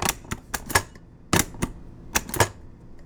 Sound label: Container Vacuum Seal Button, kitchen